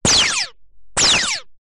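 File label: Lazer Gun Shot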